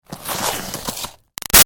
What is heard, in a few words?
Snow Slide By Icy; Skiing and Snowboarding; Sports; BigRoomSound